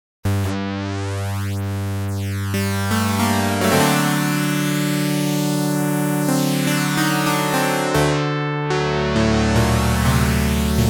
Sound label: electrax Noisy Saw